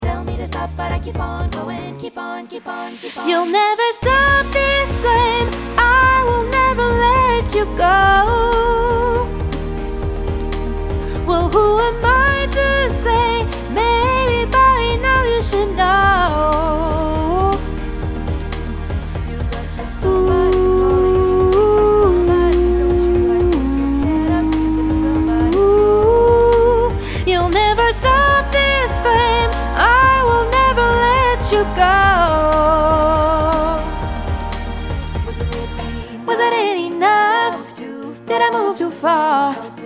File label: Soprano, ensemble vocal pop rock; ensemble vocal pop rock de larquemuse